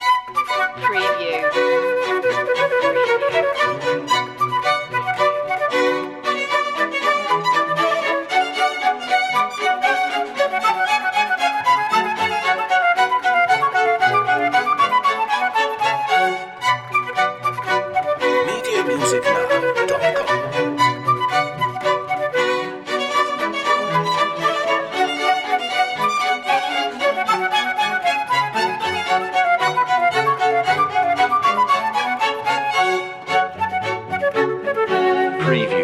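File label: badinerie orchestral suite no 2 b minor; jsbach; bwv 1067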